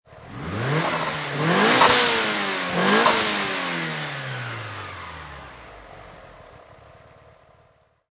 Mitsubishi Evoloution Engine Triple Rev, Auto, Power Car, High Performance Car Sound; Mitsubishi Evolution. Engine perspective. Motor rev, triple rev